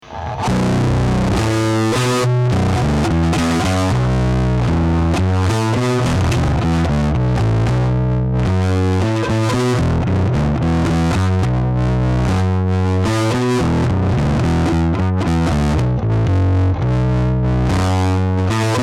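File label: bass distortion playing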